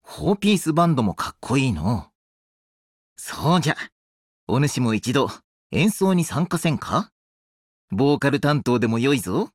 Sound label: Pop Music; Lilia